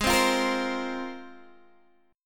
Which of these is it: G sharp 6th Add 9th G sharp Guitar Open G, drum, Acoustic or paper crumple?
G sharp 6th Add 9th G sharp Guitar Open G